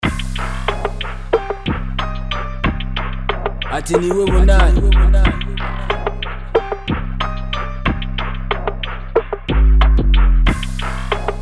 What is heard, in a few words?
Beat